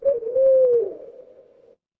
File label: animal owl